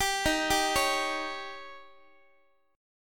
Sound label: Eb 7th, Guitar; x,x,10,13,11,12; Eb7 arpeggiated; JGuitar